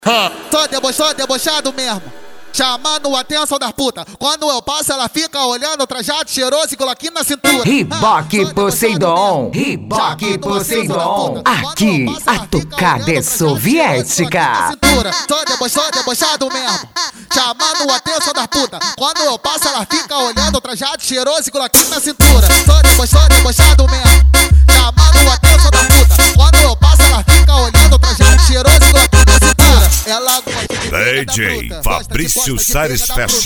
sound car; reboque poseidon sartori

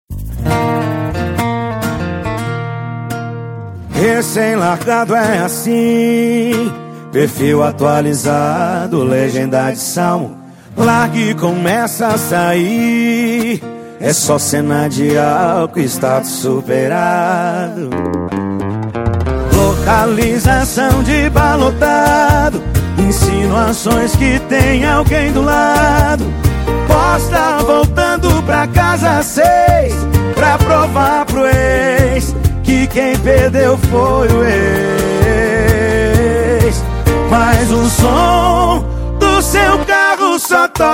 sertanejo com locutores de rodeio

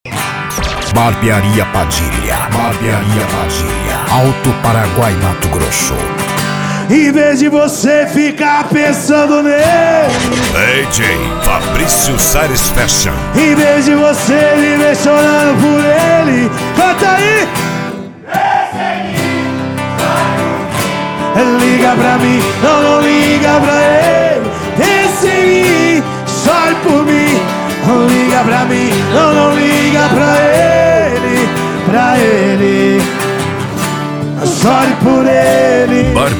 sertanejo modao; barbearia padilha alto paraguai

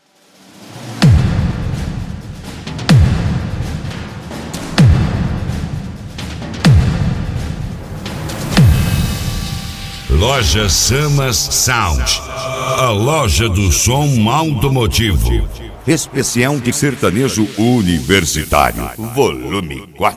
sertanejo; cd loja samas sound

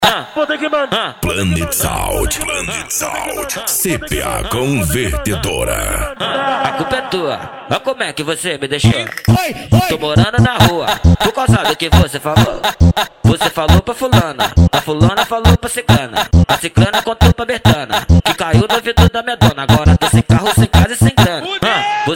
funk ritmado; cuiaba mt

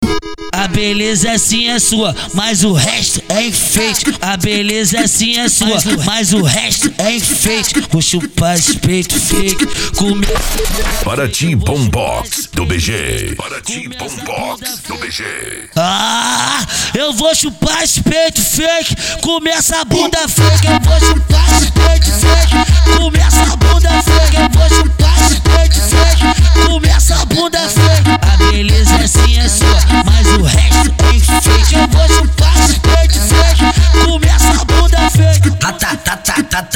bombox funk

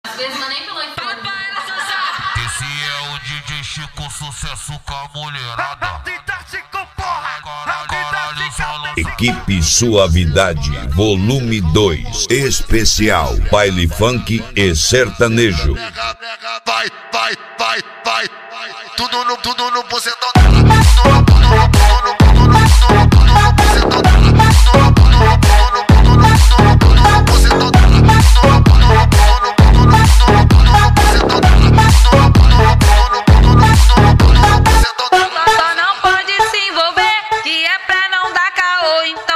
especial baile funk e sertanejo

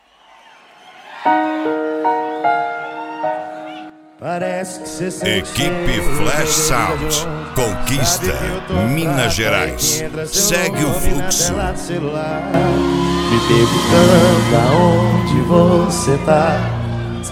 sertanejo; flash sound

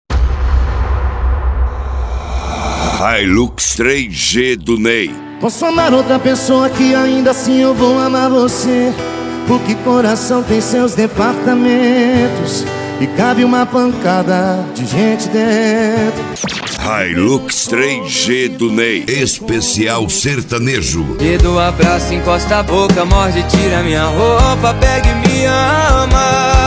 sertanejo

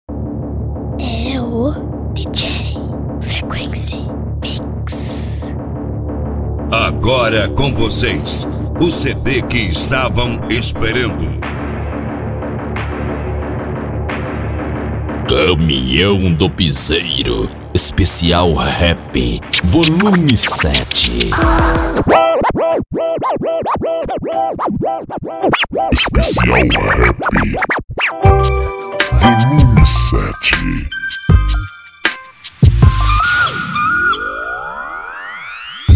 cd caminhao do piseiro esp rap

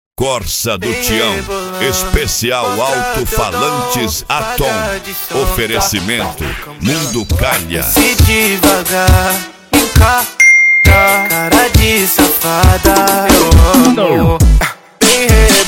esp insane sound; azeitonas car; barretos

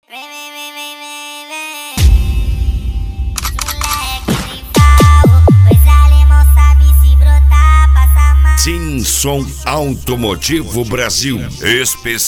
funk tum dum

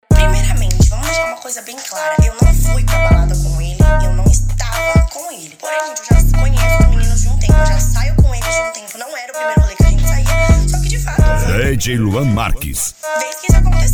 especial funk

